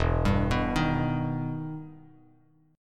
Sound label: E Minor Major 7th E down Bass Standard